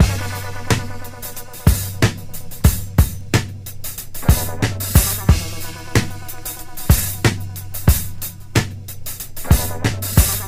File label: included guitar, drum loops